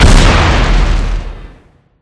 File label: boot; mine clearance; 扫雷